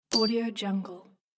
smack button

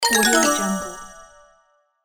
comical cute kids magic

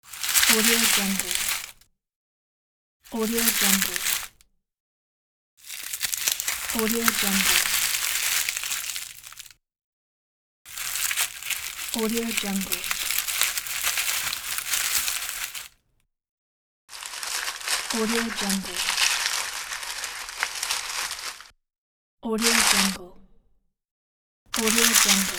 leaves rustling

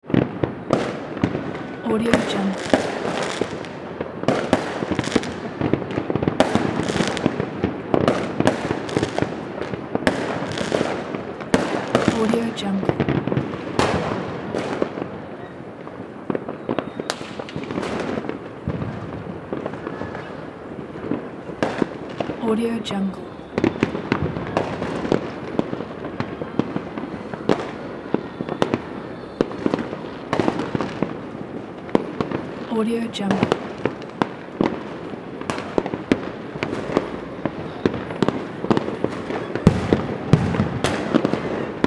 new year fireworks

